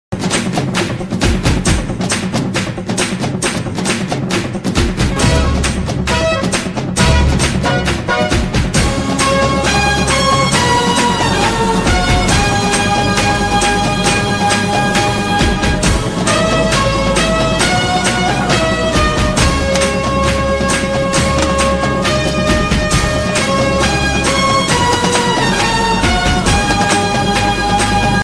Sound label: mass ringtone; sandakozhi